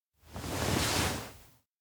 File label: hand on body; rikka; anemoi パッケージ版 1.1